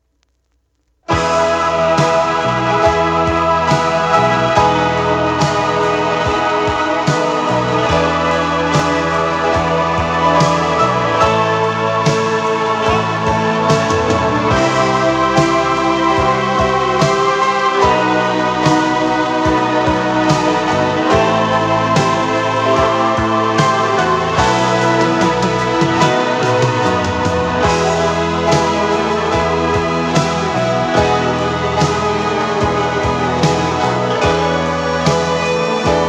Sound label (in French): Concert; 1980